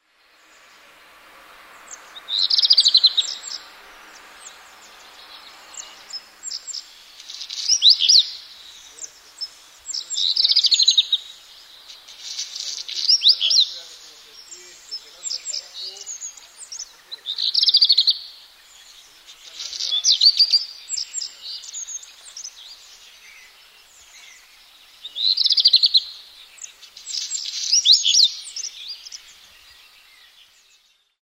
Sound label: black redstart